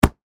Punch Boxing, Sports, Punch, Boxing, Training, Glove, Hit; BlastWave